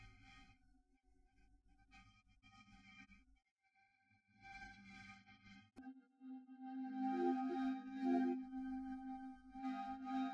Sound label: Horn; ICASSP11; Fr Horn